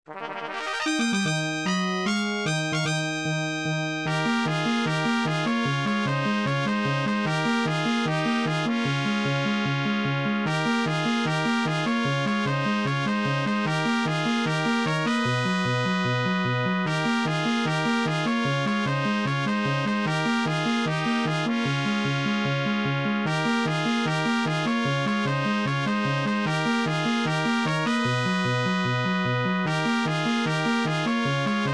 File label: battle victory; nintendo final fantasy